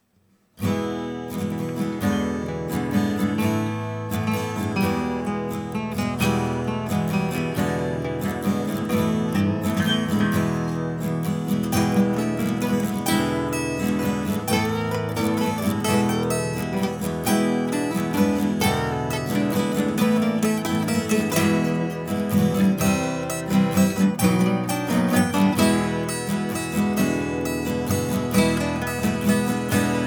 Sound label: guitar noodlin'